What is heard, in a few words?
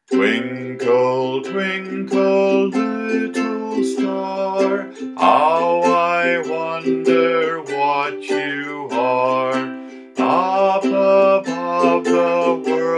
twinkle twinkle little star